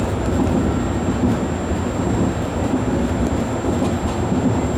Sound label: run, Train; OpenBVE